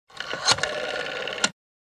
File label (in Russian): phone